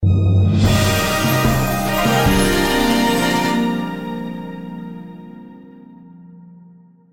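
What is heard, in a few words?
Ui Level up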